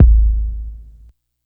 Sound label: rich low end kick drum sound a key